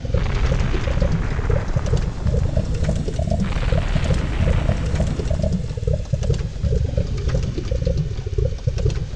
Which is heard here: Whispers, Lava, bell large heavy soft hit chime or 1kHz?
Lava